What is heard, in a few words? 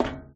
footstep metal